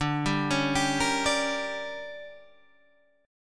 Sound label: D Major 7th Suspended 4th Sharp 5th